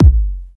warm kickdrum sound g sharp key, Royality free kickdrum sound tuned to the G# note